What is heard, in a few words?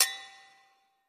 Wine Glass Clink